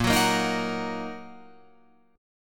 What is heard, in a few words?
Bb Suspended 4th Sharp 5th Bb down Guitar Open F; strummed